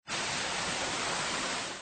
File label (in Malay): hujan sfx